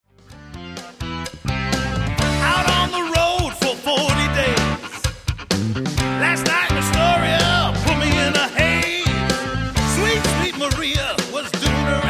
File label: Travelin Greek Band